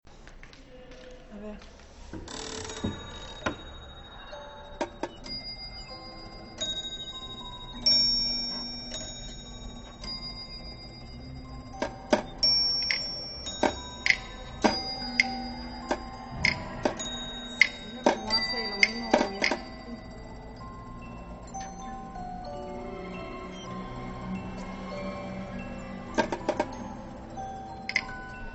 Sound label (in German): Spielautomat im Schreibtisch; Klang des Musikautomaten im Regionalmuseum von Cienfuegos (2015); Holguin; kuba